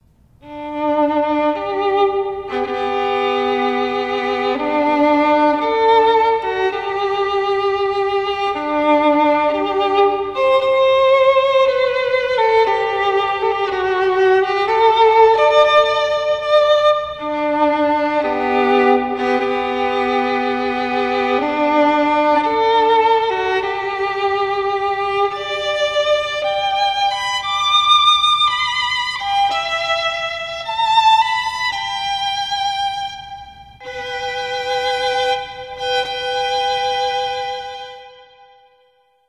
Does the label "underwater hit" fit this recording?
No